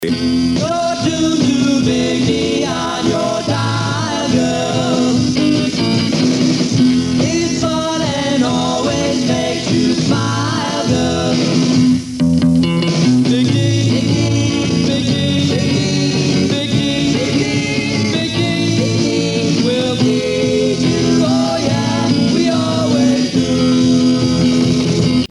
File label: jingle